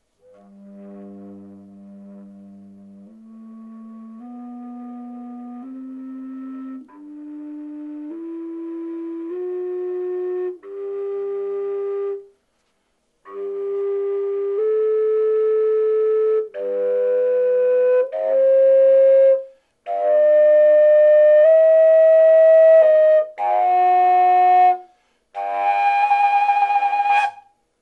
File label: fujaridoo g scale, folkart slovakia; fujaridoo locust